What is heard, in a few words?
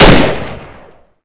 vp70 fire